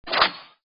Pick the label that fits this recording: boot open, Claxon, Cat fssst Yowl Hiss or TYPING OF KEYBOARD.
boot open